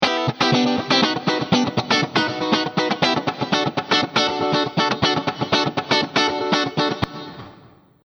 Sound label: Funky Git Lrg Hall